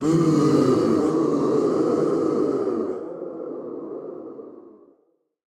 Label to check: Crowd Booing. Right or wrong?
Right